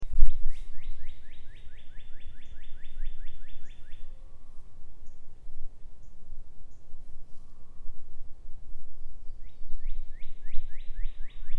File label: birds; Rhythmic Patterns in New England Landscapes